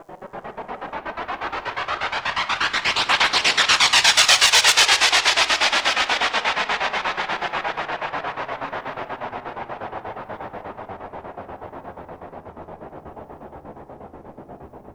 Textures Jet Wash Blanket, ImpulseResponses; Ableton Live 12 Lite; Hybrid

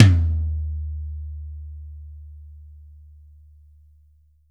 Tom Mid Gretsch, Drums

